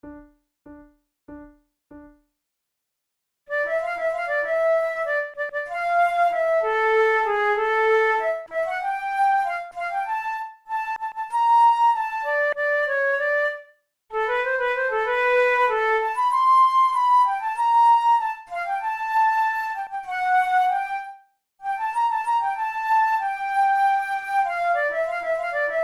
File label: duet in d minor, flute; kohler op93 no2 duet no9